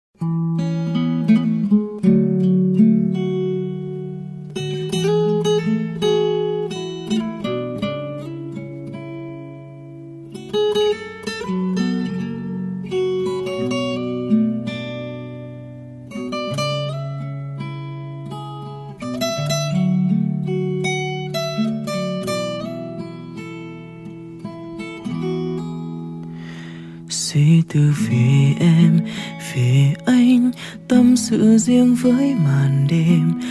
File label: guitar acoustic